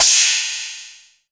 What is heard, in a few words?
MIX CYM, FX CYMBAL